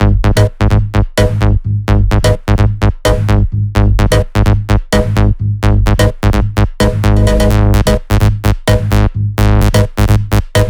Melody 1, 128BPM; Prophet Melody 1

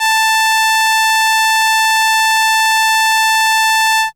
Violin A5, ARP Solina, Unmodulated; musicradar-synth-samples

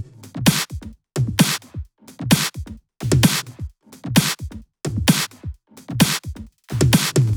130BPM Organity Kit; Vengeance Samples

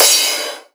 Crash, Cymbals; Vengeance Samples; VEH2

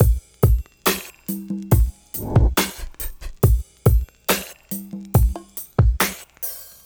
Slomo Beat 140 BPM, Loops, Slow Motion Beats

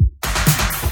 Fill Ins 128BPM; Vengeance Samples; VEH2 Loops

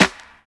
Claps; Vengeance Samples

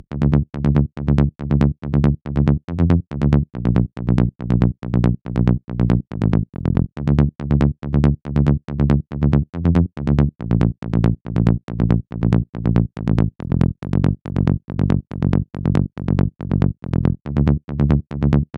Bassline 140BPM, Dminor; Bassline & Melody; Vengeance Samples; Beast Kit

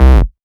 Bass C, Offbeat Bass

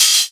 Cymbals HH Open; Vengeance Samples

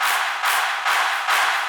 Crowds; Vengeance Samples; VEC2 FX

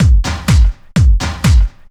drum loop 125, puristic, vocal house; PS-28 Vocal House Samples; drumloops

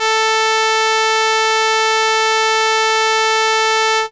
Viola A4, ARP Solina Unmodulated; musicradar-synth-samples

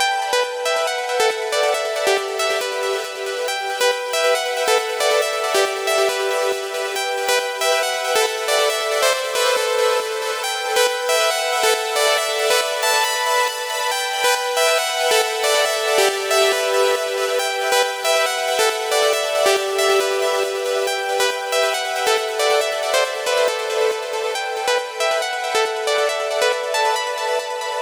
Pluck WET 138BPM, Eminor; Bassline & Melody; Vengeance Samples; Freefall Kit

